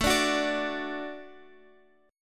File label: A Suspended 6th A